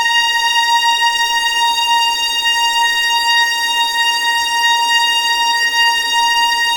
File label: A#5 LEG FVL R, The Ultimate String Collection; East West